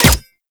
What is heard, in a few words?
Energy Rifle Fire; Energy Rifle Vandal; Weapons